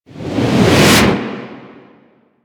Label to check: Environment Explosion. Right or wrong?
Right